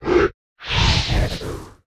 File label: Rock Throw Grab FLAME; Evolve; Meteor Goliath